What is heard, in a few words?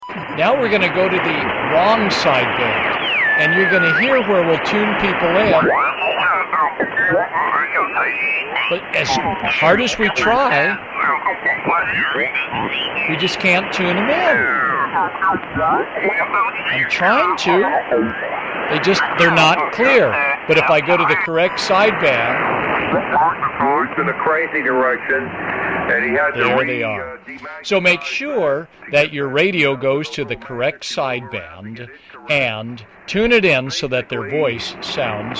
ssb, noise